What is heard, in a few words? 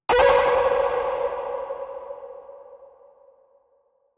FX Vocal Impact (C); Vocal Impact 1